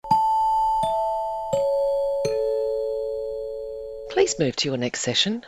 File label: four chimes descending please move to your next session